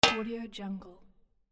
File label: robotic ding